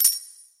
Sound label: coin